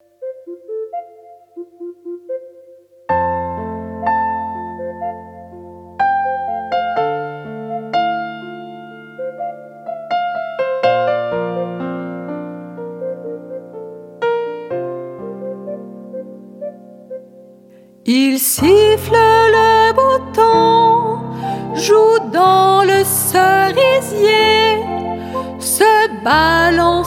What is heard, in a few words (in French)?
Le merle, Les animaux que j'aime; S-Active éditions